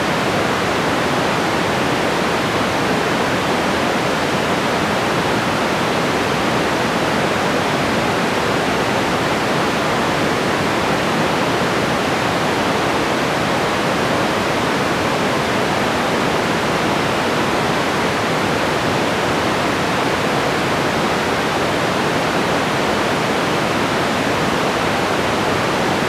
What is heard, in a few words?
Coloured Noise Baseline (L=R -6dB Stereo); radio test radio experiment